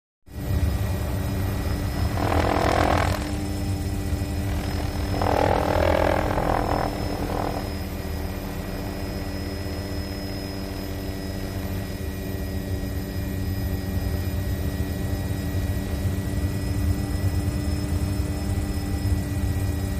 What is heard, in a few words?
Hughes 500: Hover Steady; Quiet Engine; Hughes 500 Hover Inte